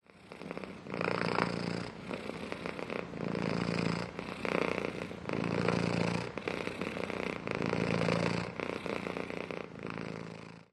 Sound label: cat purr